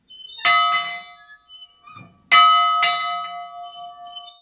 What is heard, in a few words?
School Bell